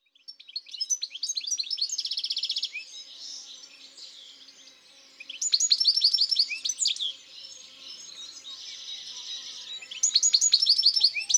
Chardonneret elegant, Chants d'oiseaux dans la campagne

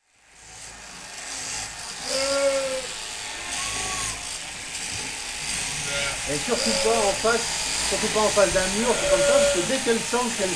La tonte des brebis; Saint-Pierreville; Ardeche; Ambiances Sonores d'Ardeche; Studio Les Trois Becs

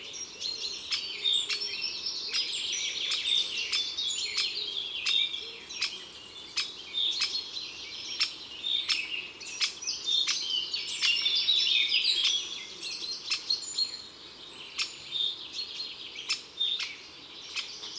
Pic epeiche cris; Drome; Concerts Naturels de la Drome; Studio les Trois Becs

